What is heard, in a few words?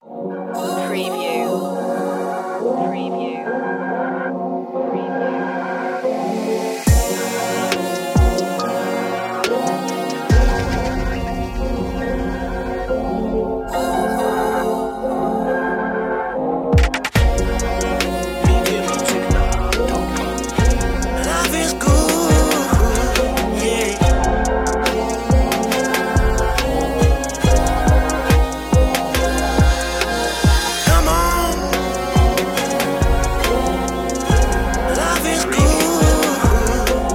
smooth soul vibes